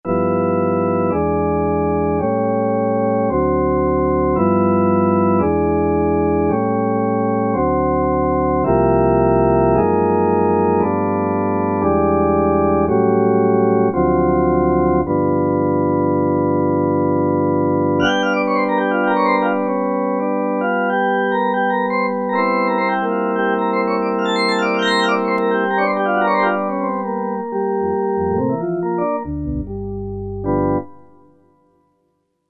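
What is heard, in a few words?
organ Warm Tone; Factory Presets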